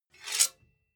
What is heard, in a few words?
sword scrape